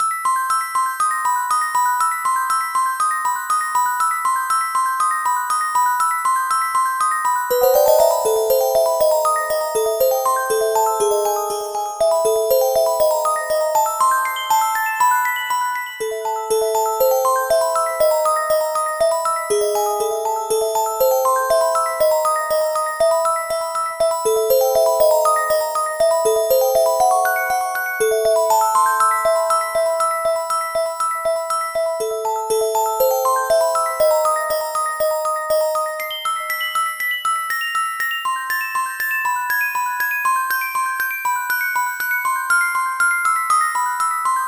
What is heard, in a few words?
Haunted House (Fast); New Super Mario Bros. 2; Gold Ring layer